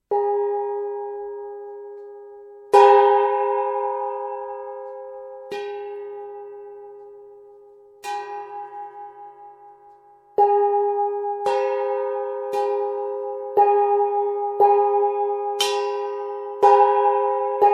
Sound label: gong